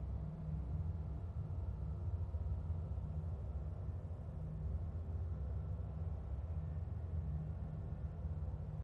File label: Monastery Interior Loop; horror and atmosphere fx; Silent Hill Downpour